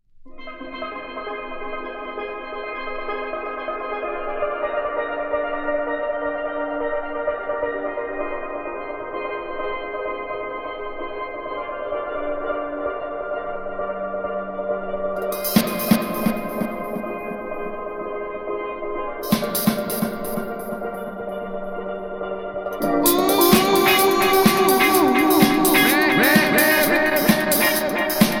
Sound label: Afro Punk Reggae